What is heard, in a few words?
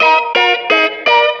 guitar, Loops; Phonky